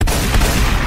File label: movie shotguns